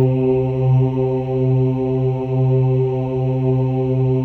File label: CHOIR OOS, C3; Spectrasonics; Symphony of Voices